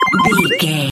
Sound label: collect item sparkle pop